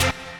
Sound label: HOUSE, House Dance